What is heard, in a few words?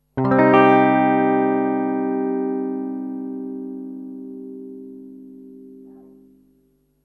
c minor, minor chords